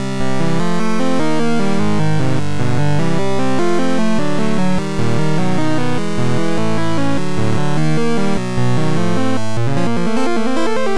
Dungeon Floor; Deep Dungeon II Yuushi no Monshou; FDS

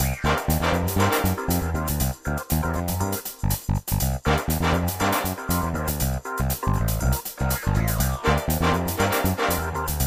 Music; Diner Dash 5 Boom

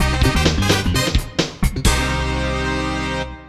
Course Clear (Negative Ver.); B3313; n64

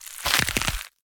Enemy Transform; Chloro Cbf Guy

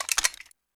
assault rifle equip

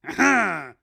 pirouette; Hollow Knight; Zote

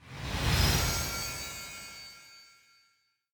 Sound Effect - Population Increase

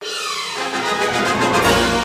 Flight Storm; the adventures of tintin the secret of the unicorn

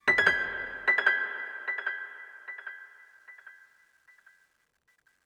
One shot Piano

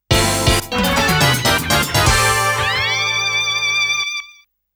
Jingle of Time Out; Namco Game Sound Express Vol.25; Alpine Racer

